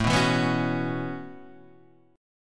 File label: B 7th Suspended 2nd Suspended 4th, chord